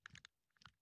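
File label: foley assault rifle ak47 inspect; weapons; wfoly ar ak47; viper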